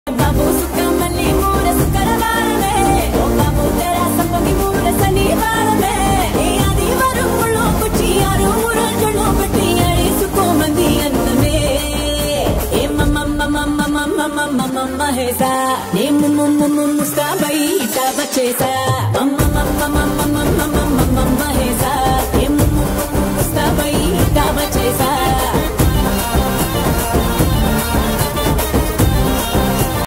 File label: tamil ringtone